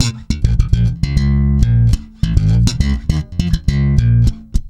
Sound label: A#, hard funk, bass; Spectrasonics; Bass Legends